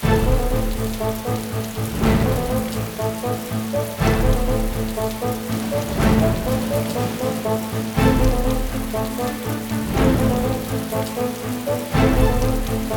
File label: Competition Rain; pippa funnell 2 take the reins ps2 windows gamerip 2006